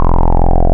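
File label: square, wavetables; Animoog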